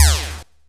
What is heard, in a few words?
laser Large